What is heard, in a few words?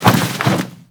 Roll Forward Foley; Warframe; Characters; Tenno